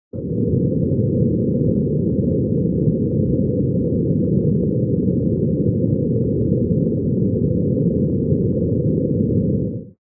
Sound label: thrust, asteroid